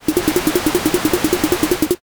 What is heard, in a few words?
carriage